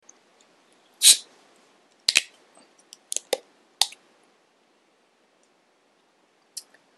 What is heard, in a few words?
Coca Cola Refreshment